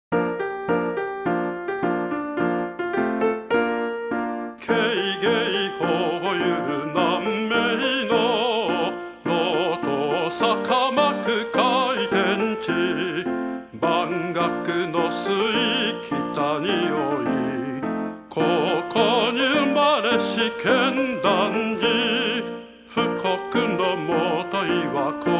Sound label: piano old; 旧校歌（ピアノ 独唱）